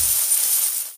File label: bowl shake; jackpot